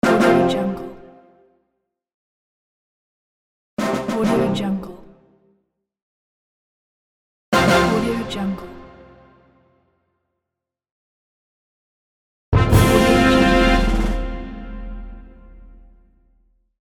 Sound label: military fanfare